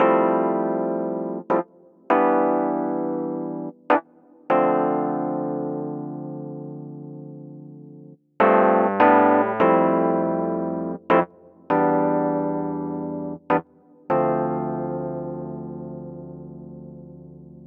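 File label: Pop Mark II, Fmaj, 100 bpm, E-Piano; Pop Rock; E-PianoClav; Pro Tools Essential Loops; Digidesign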